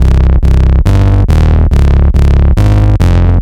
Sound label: Dissector, hard style